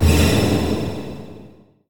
spell fear; Crypt of the NecroDancer

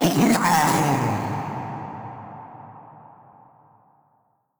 death; Crypt of the NecroDancer

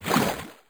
water in; Crypt of the NecroDancer

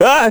ice slip; Crypt of the NecroDancer

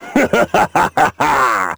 dorian laugh; Crypt of the NecroDancer

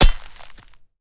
axe mining ore; Factorio

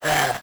skel hit; Crypt of the NecroDancer

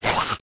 skel attack melee; Crypt of the NecroDancer

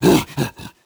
king conga attack; Crypt of the NecroDancer

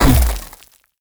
gargoyle death; Crypt of the NecroDancer

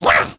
goblin attack; Crypt of the NecroDancer

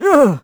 hurt; Crypt of the NecroDancer

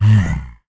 yeti death; Crypt of the NecroDancer

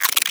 spider falloffwall; Crypt of the NecroDancer

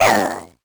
goblin death; Crypt of the NecroDancer

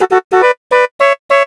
horn; TrackMania Nations Forever; Skins

